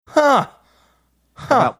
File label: "huh huh"